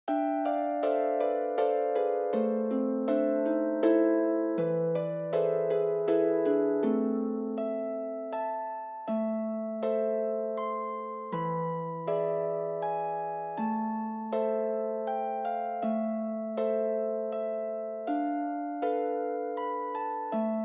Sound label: trio, guitar